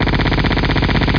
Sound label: bullet lp; Hostile